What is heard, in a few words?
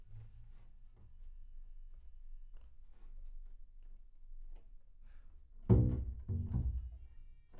Bass Room